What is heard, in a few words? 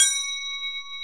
Crotales, Orchestral Perc